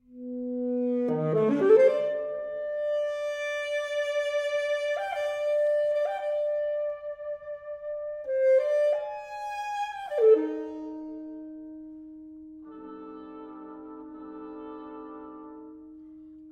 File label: Sextet for Alto Sax and Brass; edgreenmusic